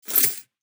Coins Gears Slot; SNKRX

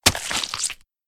Dagger Stab Flesh; SNKRX

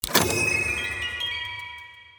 Unlock; SNKRX

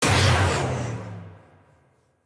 Magical Impact; SNKRX

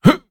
Male Jump; SNKRX

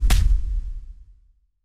Shadow Punch; SNKRX

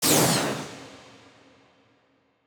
Magical Impact; SNKRX

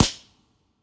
Pistol Shot; SNKRX

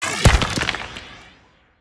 Earth Bolt; SNKRX

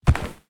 Body Fall; SNKRX

